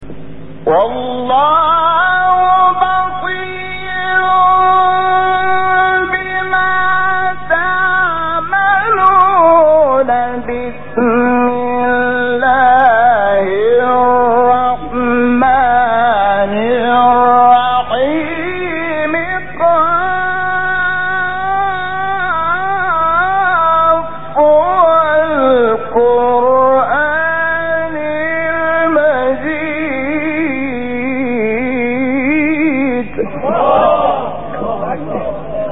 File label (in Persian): فراز زیبا از سوره; ق; استاد شحات محمد انور